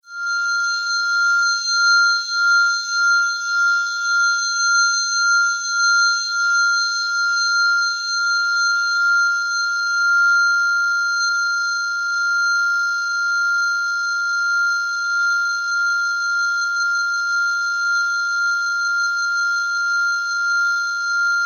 Science Fiction Laser Scope High Pitch Tone

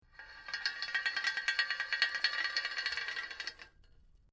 Metal Chain Pulley Rattling Chains; Chain sounds

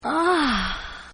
Lady SEXY SIGH; Exclamations; Voice

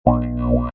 Cartoon Spring Boing, Wah-wah'ed sproing bounce.; Comedy/Cartoon; Boings; Sound Effects:Cartoons; Lynne Music

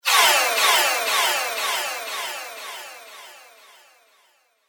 Arcane Magic Turning Spell; Magic Sound Effects

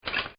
WATER; Ocean And Sea; Water Lapping Under Boatshed; Partners In Rhyme

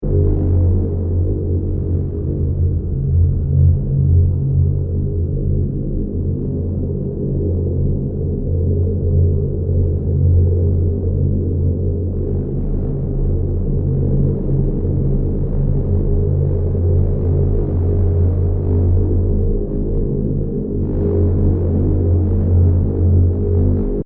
Deep And Dark Atmosphere 080bpm; Scary Soundscapes; Horror; Crafted Signals

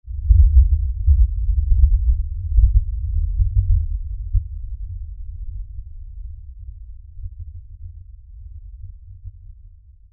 LFE Tremor, Lfe Tremor Low Bass Shake Rumble; Rumbles; Blastwave; Sound Effects:Sound Design

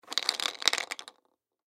Baby Toy Chair; Baby Chair Shake Rattle; Miscellaneous Cartoon; Household; Blastwave

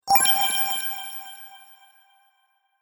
Sparkle Idea, magic sound effects

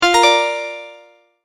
multimedia tone start

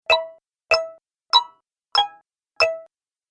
App Menu - Five Sounds, Ping; Multimedia

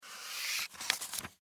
Office Book Hard Cover Page Turn Single Long; Offices

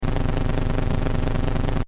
Sci fi machine loop, Scifi Machine: Loop, Short; Control Panels; Stuart Duffield

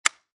Rifle Lever Action Dry Fire; Dry fire / misfire click; Gun Handling

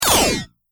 Science Fiction Laser Closing Beams; Laser Sound; Sci-Fi Laser Sound Effect; Andrew Fleming

